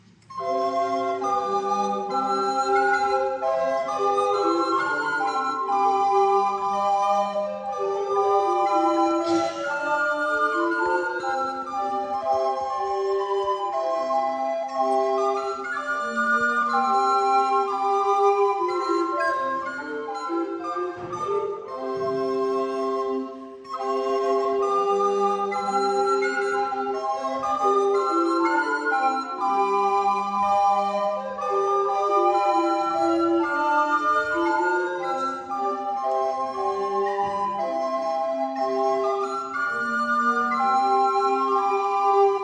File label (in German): floeten; anthony holbore the honie suckle